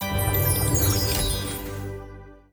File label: joker multi; Bell Wizard; wazdan